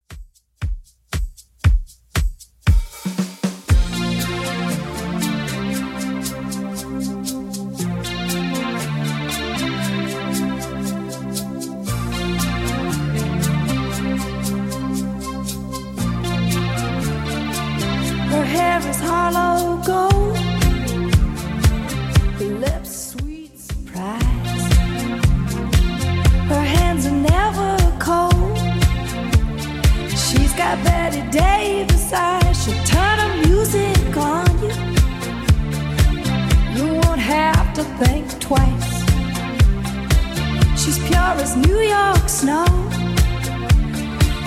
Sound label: 80s 117bpm; Redrum